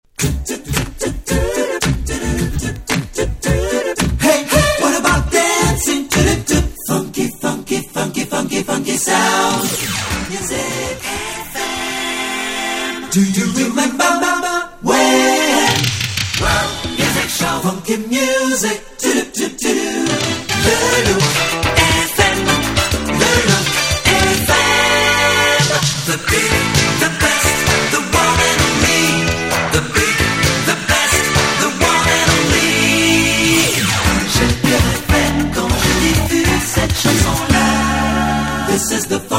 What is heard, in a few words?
jingles